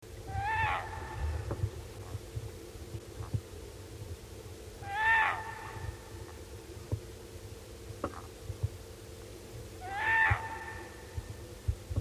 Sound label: HIGH SHRILL, loon